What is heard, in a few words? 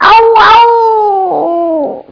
foot right; TomCat